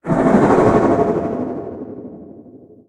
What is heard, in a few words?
cleave spin; smite; thanatos